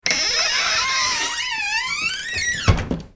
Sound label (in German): quietschen